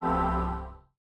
recovery; HumanBreak

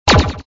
floor

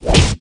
attack; Eustia

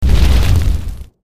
lava; shengqishi; first area

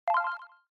tree; HumanBreak

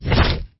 Attack

